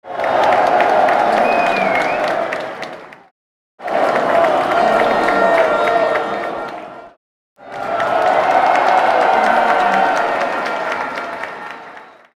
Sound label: cheering crowd